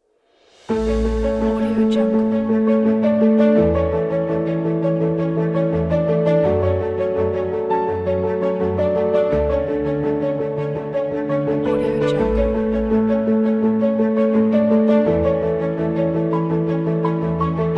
beautiful classic

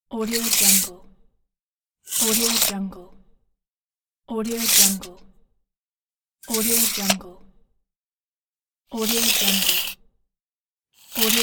measuring tape

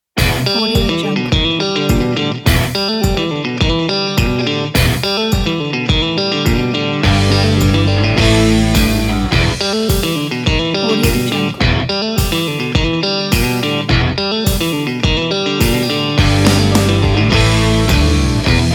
rock western

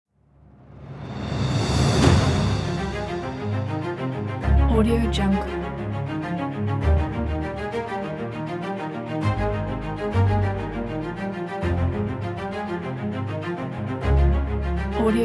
violin

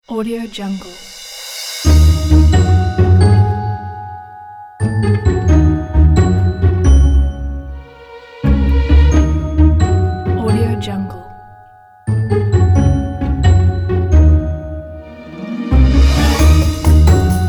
comedy sneaking

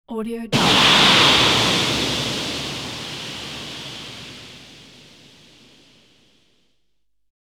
missile launch; sound-ideas

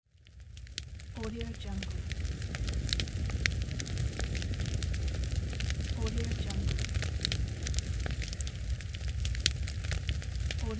fire

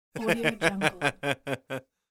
male silly laughing

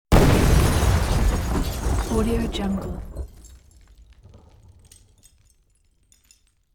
explosion massive glass debris; hollywoodedge

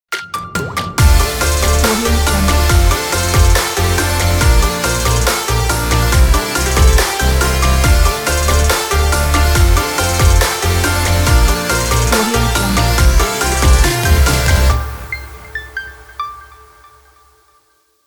modern electronic ident

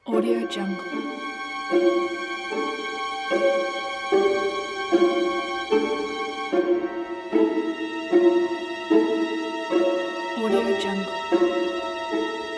creepy

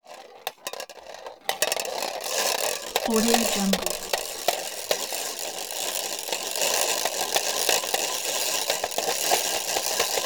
tin can ball roll

